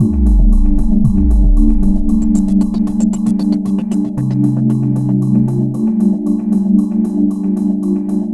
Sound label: vibes